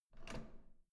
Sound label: door handle up